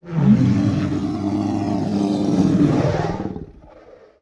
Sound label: Dinosaur Design, Vocals - Monster; Sony Pictures Sound Effects Series